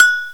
low agogo; gmplay; drums

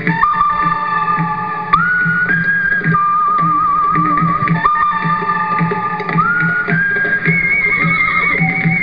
theme; shootout

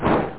whoosh; sfii

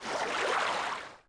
swim stroke; DAoC; Toa; Gamestar

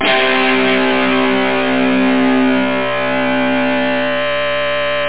ELEC GUI

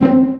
pizz

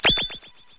damage cancel

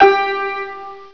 Santour 8va; Prince of Persia 2 Demo

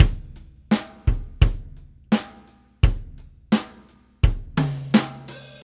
Country 86bpm, Drums; Rock; 2m

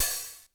Open HH; AudioFusion TWS 1.0.1

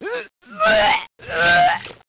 BUTTON

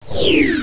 SHRINK

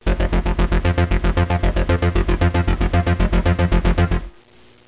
TECHNO

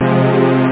synth chords; OctaMED SoundStudio V1

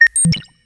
sci-fi beep; Earth and Beyond; EA Games

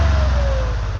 Rev Car Stop; UrbanChaos

